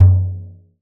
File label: tar; 96khz 24b mono; instrument de musique; Tar – fabrication Norb